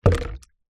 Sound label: household small plastic container with water in down of surface